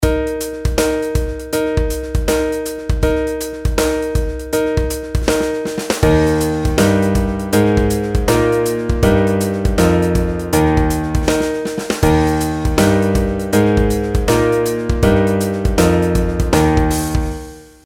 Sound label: pitch patterns skipwise